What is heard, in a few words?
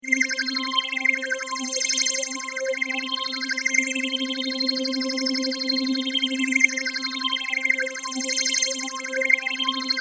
Mutron biphase